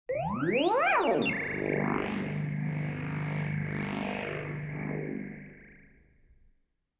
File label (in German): Science Fiction Energiefeld Laden